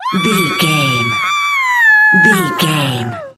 voice female scream

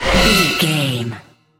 fear confuse horror scare

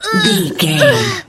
voice female death

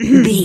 voice female cough interrupt

